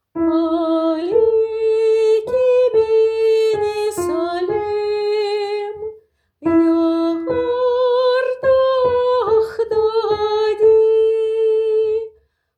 Aliki soprani; alaiki mini salam

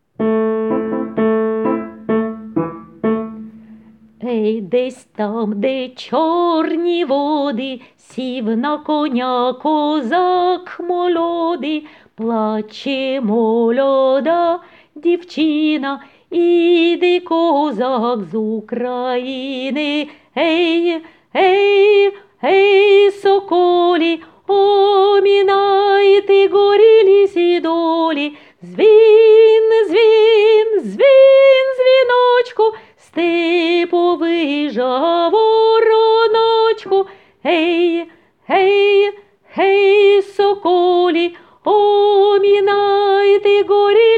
He sokoly Soprano

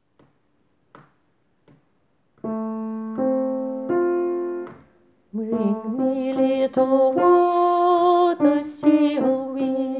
Bring me Alto et hommes

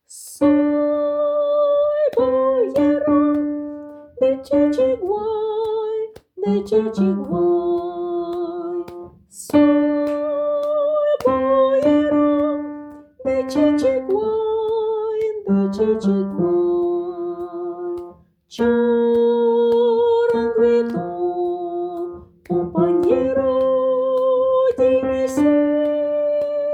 Soy boyero hommes

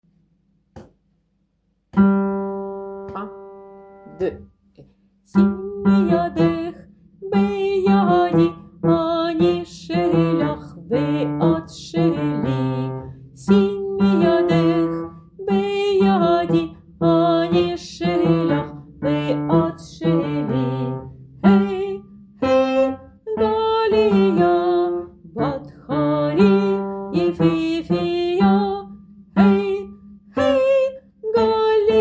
Simi yadech hommes; simi jadech

